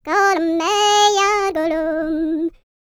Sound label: PERSIA, World Voices, Vocal; ILIO; Vocal Planet VOL-5